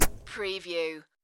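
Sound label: Golf Practice Swing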